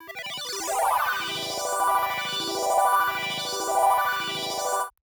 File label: granular